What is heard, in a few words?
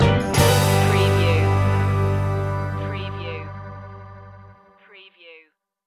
vintage blues stinger